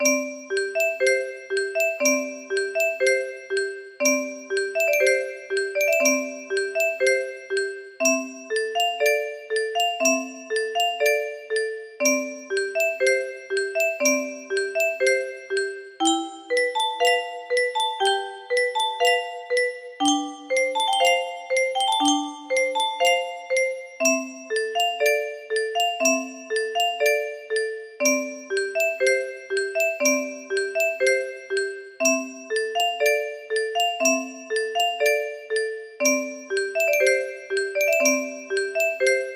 carillon

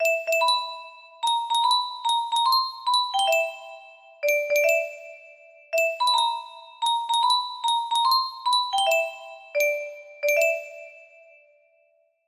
suspenseful melody, music box melody

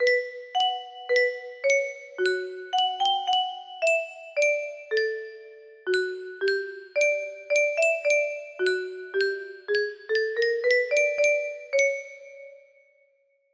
melody, music box

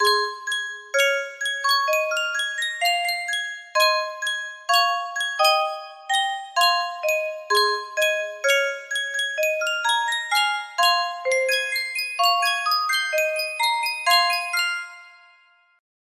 sankyo music box

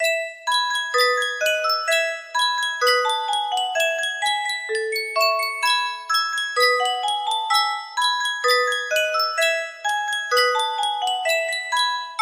Yunsheng music box, melody

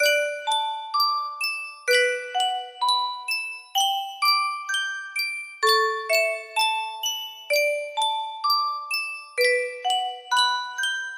Sankyo Custom Tune Music Box

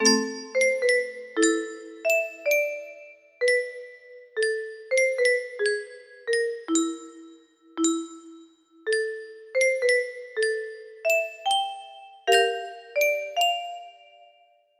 melody

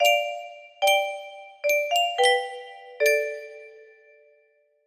Untitled, music box melody

